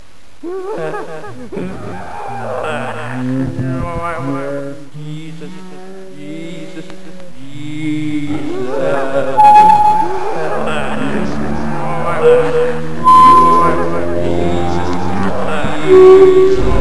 scary

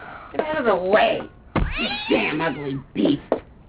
kick

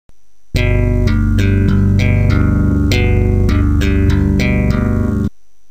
folk slap, bass

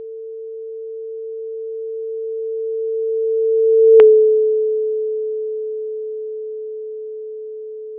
doppler

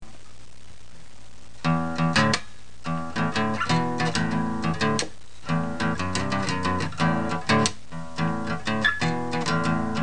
Acoustic

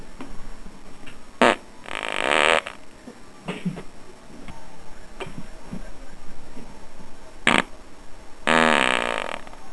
double trouble, farts

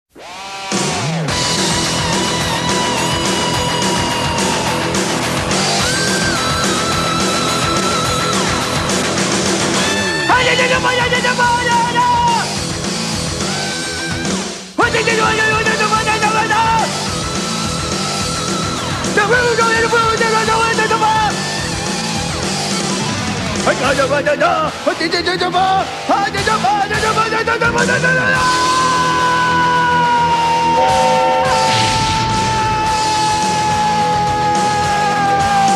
Heavy Metal; Brak; funny hospital story

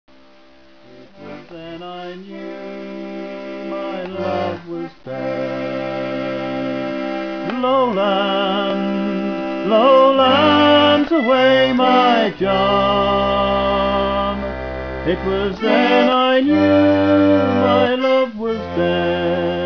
English Medley

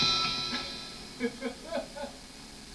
laughing

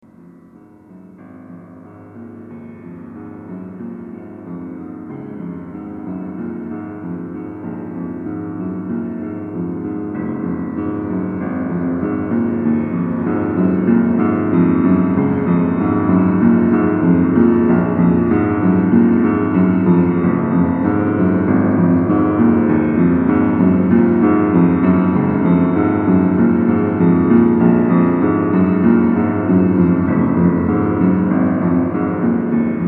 Piano Song

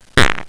fart

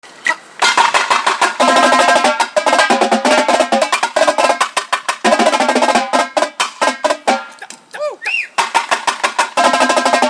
tenor solo, drum

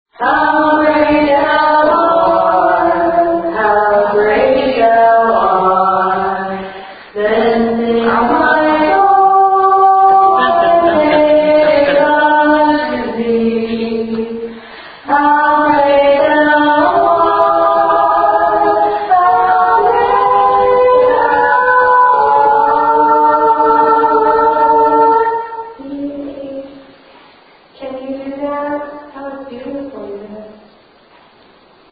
The Dance Studio evp weeping

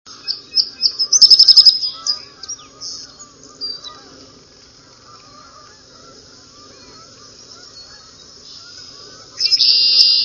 sparrow song, song sparrow; birdsongs

